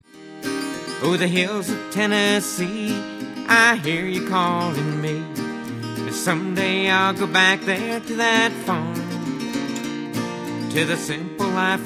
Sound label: Acoustic Track